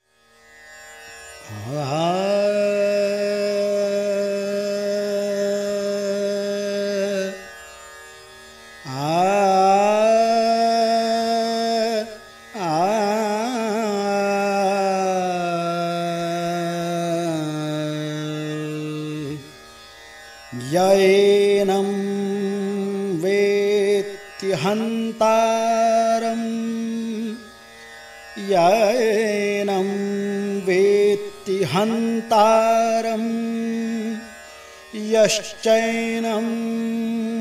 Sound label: chant; kedar; abhisheki